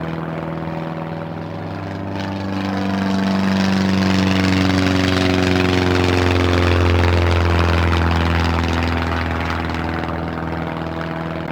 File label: Plane